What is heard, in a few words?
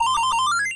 ball hits hole, golf